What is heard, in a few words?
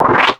SFX, Drum & Bass, 160 BPM; Ueberschall; Drum & Bass Resonance